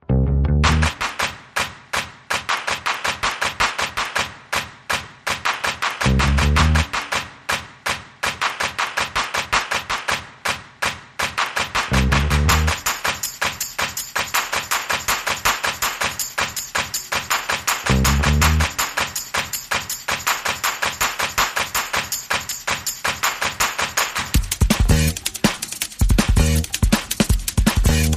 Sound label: Bass Perc and Claps Only